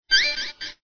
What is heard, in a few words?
option, ringtones; Metal Gear Solid